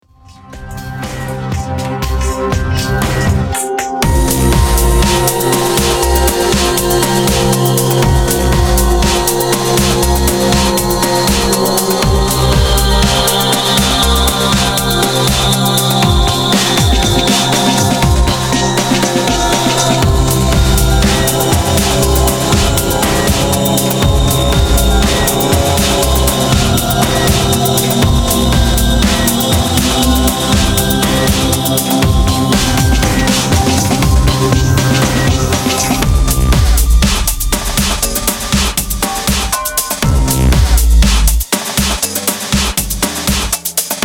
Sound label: Ending Music; Swindle; Wii U